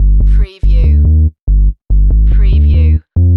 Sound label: Techno-Trance Sub Bass Loop in G 142 BPM